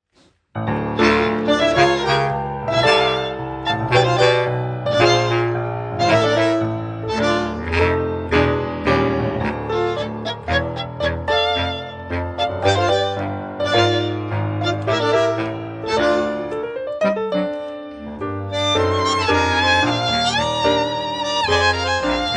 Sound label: tango; Formation originale de Tango Argentin avec clarinette basse !; BANDONEON